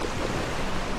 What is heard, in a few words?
lake waves